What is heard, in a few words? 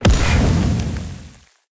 fire; Smite; Vulcan